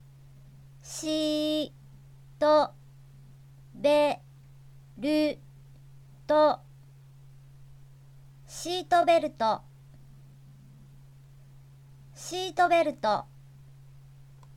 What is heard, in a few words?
seatbelt